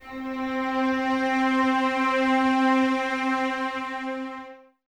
cellos C 4, String Ensembles; Miroslav Vitous; 90 s Sample CDs

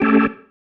Stab, Organ, One Shots

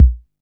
Kick, Drum & Bass; AMG - Prototype Drum & Bass

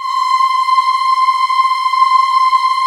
AAH; AMG

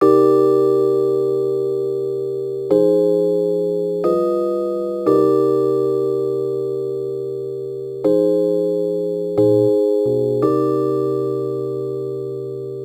Rhodes Attacks; Ueberschall

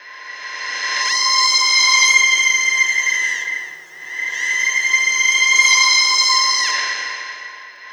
crying out; Spectrasonic Distorted Reality 1

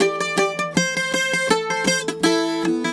Celtic Flavours; USB Soundscan vol.42; AKAI

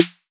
808 SD Orig, Snare Drum; Goldbaby Tape808 & Tape909

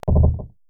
PERC MIX

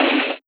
Jungle Kick

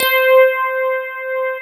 FM ELP 8 C4 R, Keyboards Old School; USB Soundscan vol.09; AKAI

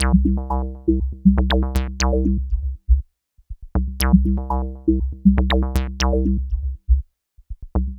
Synth

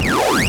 scifi, SCIFI & SWEEPS; Techno Trance Essentials

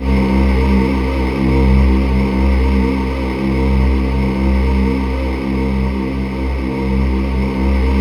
C2, Synth Leads; 90 s Sample CDs; NiceBeats (NiceBytes); Studioline 09

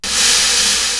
SD Brush Swirl, Korg TR-Rack drum machine

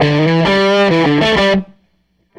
SG Guitar Loop

